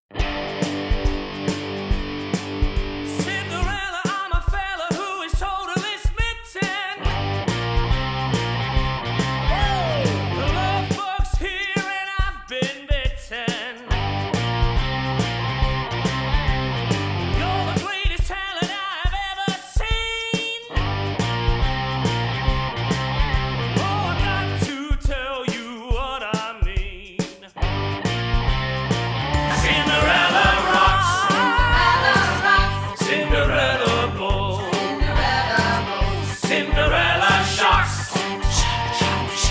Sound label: vocal; Cinderella Rocks